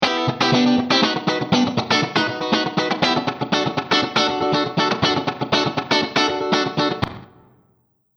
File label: Funk Git Hall